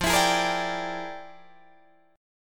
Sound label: F Minor Major 13th, Guitar, DADGAD